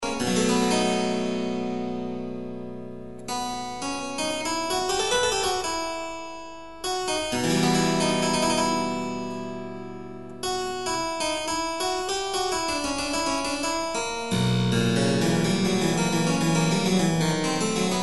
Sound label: well tempered clavier prelude c minor; bach